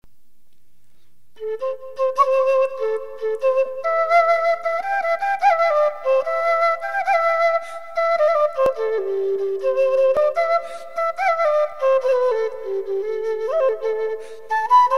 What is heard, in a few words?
Quena